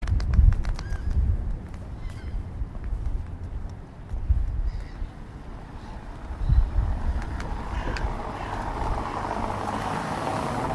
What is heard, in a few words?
thames ambience in richmond at dusk with birds bikes cars; people walk